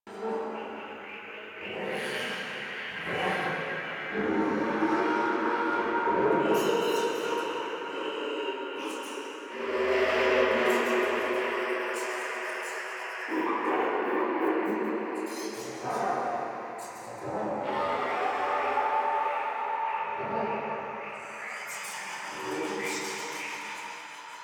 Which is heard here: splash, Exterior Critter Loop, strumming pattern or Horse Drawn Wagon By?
Exterior Critter Loop